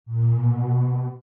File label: close; Страшные звуки для монтажа